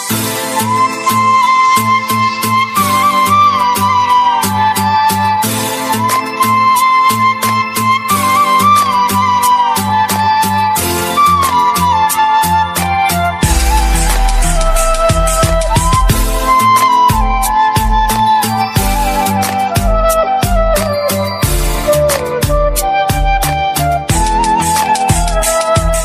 sweet sad music ringtone, bollywood

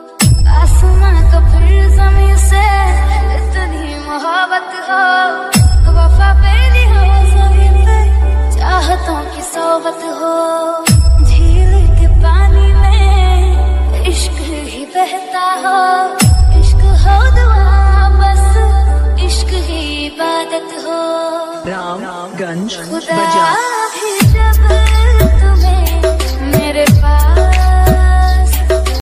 romantic bollywood dj music

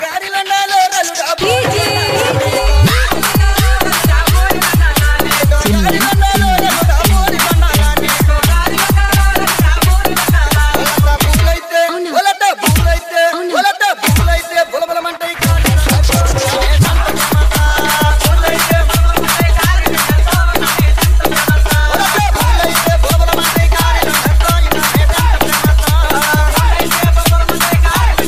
dj ringtone; tiktok

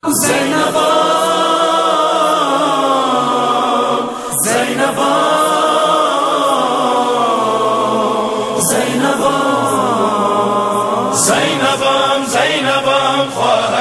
noha ringtone

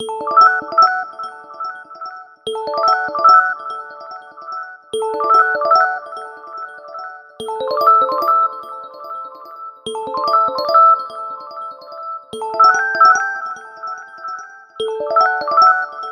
echo ringtone, iphone ringtones; apple iphone x

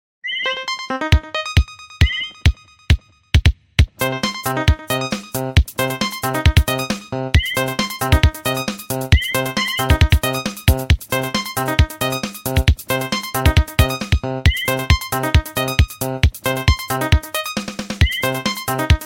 nokia disco ringtone

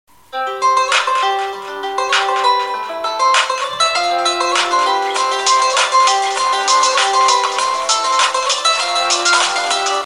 xiaomi orange redmi ringtone

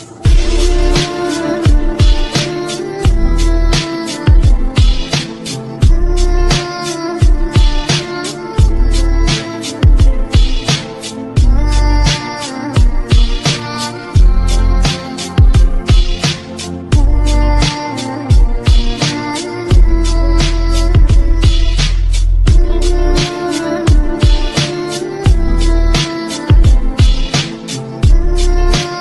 english bass boosted ringtone

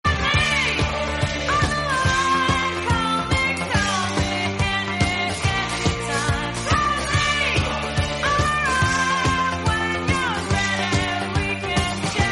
rocking call me mobile phone tone ringtone; galaxy ringtones

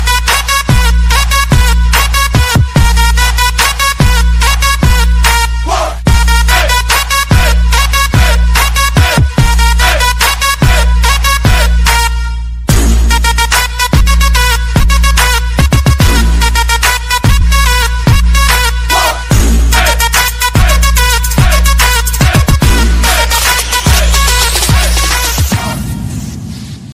english romantic

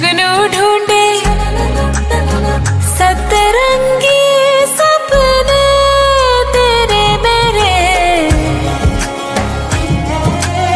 new hindi ringtone, bollywood ringtones

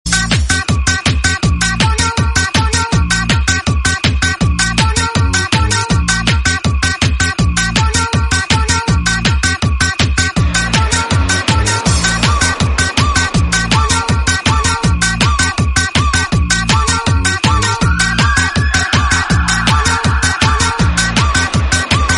techno ringtone

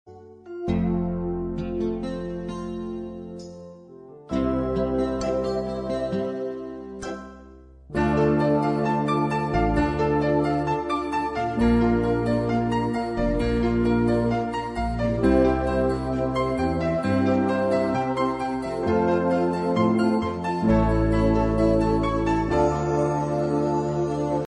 calm music

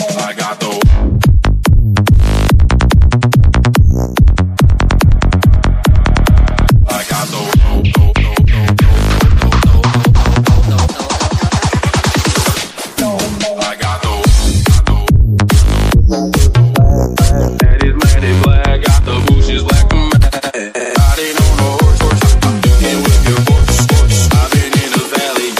cool ringtone

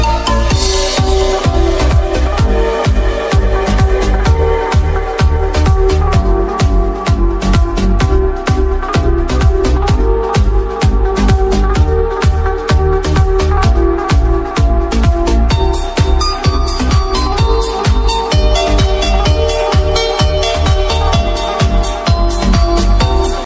dj remix english ringtone; english ringtones

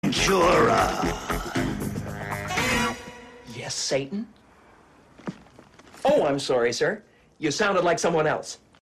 ringtone

